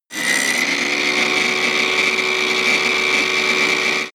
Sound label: tile cutter free